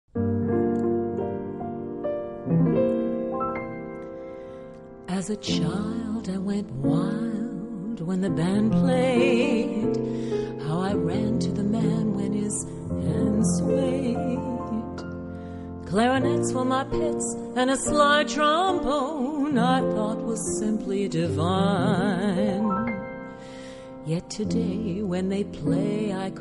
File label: piano